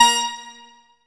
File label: ACID BASS; AKAI S6000 CD-ROM - Volume 1; ACID LINE